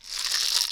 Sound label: box rattle; Kenya Box Rattle